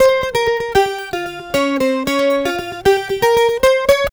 guitar, stringed instruments; Sonic Foundry (Sony Creative Software)

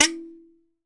KALIMBA; E-MU Producer Series Vol. 7 – Old World Instruments

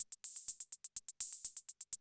CR78 125, drum loops

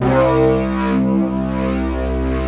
big disto; East West - Global Sounds

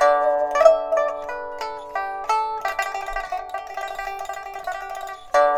PIPA, 90; INIS - Opium

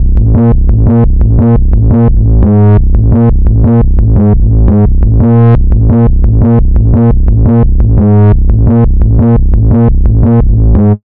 Convoy Bass, Drum n Bass; KJ Sawka; Drum n Bass & Beyond

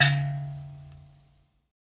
PLK Grand Mbira

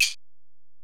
Ac Perc, Drum Machines Alesis DM-PRO

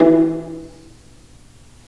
Vas Pizz, String; Vlas Pizz; Roland - String Master Series

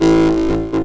saw lead, synth notes; Techno Trance Essentials

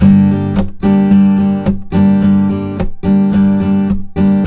Blues Guitar Samples, RIFF; ILIO; Fretworks

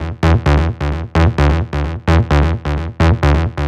NRG Bass, Trance; Basses; Sonic Foundry (Sony Creative Software); Trance NRG VOL-1

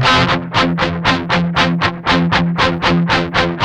8TH F#3, Guitars; Spectrasonics; Hans Zimmer Guitars VOL-1; 128-JACKHAMR

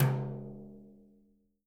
BRUSHES, Living Drums; Sampleheads; Peter Erskine Living Drums

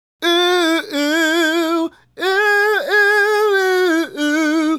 Male Solo, Hip-Hop and RnB Vocals

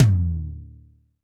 TD-20 Tom A, Roland TD-20 Toms

